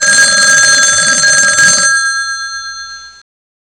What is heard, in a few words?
PHON RNG; BUSINESS